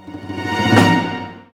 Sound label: Lucky Stinger; Ben 10 Protector of Earth; Wii